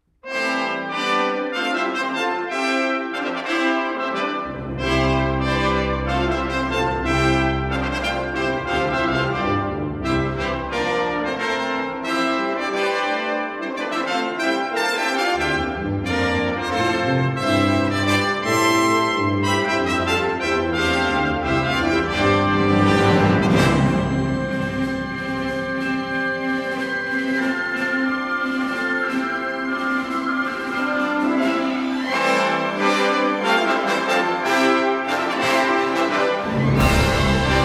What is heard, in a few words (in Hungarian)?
fanfár; zenekari muvek